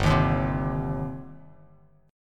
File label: G Suspended 4th Sharp 5th G down Bass Standard; Gsus4#5 strummed; JGuitar